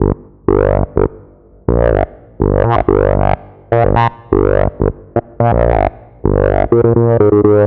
Blow Back, Bass; Sonic Foundry (Sony Creative Software); Underground UK House & Electro

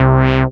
SYN SH, Roland; Sound & Vision - Gigapack I CD 2

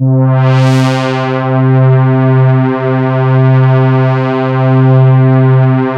MONO PAD; PADS

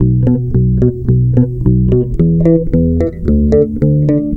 bass, Roots Funk; USB Soundscan vol.41; AKAI

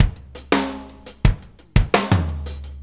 Drum Tools, Loops

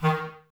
Clarinets, Wood Winds

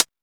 Techno D; EMU XL-7

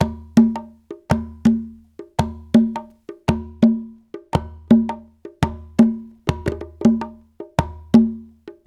Latin Congas, African Contemporary

